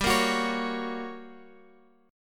G Minor Major 7th Flat 5th, G, down, Guitar, Open F; GmM7b5; strummed